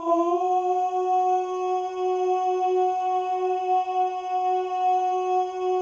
male ohs; Spectrasonics; Symphony of Voices

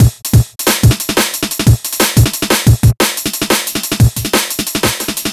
amen 180; Amen Breaks; navarone

